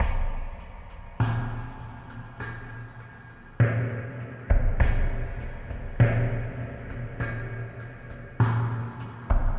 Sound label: Funny you funny me drums